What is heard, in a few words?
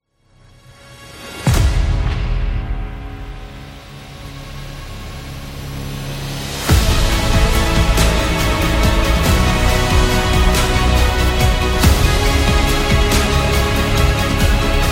Epic Promo